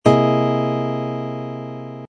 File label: c dominant seventh sharp five sharp ninth chord voicing, guitar